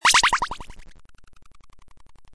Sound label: freeze; doublespeakgames